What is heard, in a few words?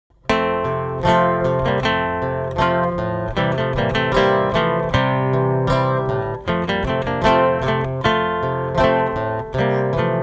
acoustic; Judas Maccabaeus